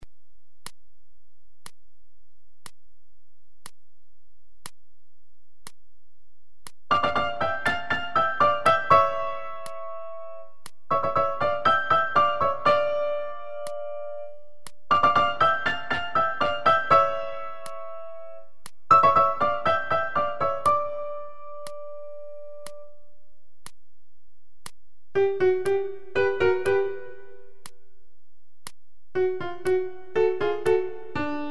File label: Tango Argentino 60; Uebungs-CDs; Playbacks; Seiber